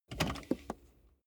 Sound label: car bmw e46 interior seat adjustment lever